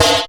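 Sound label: snare, Acidic Kit, Percussion, Dance; Dance Synths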